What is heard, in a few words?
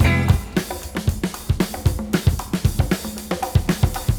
breaks, breakcore